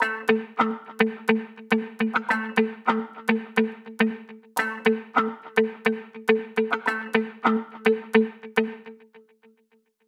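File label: organic loop guitar loop pick, 105, A major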